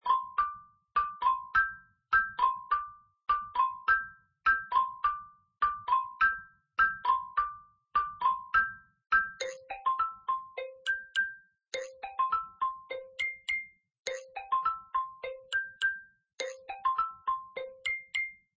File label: bgm